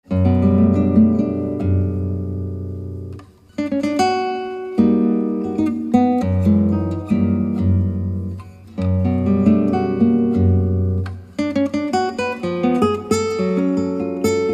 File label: classical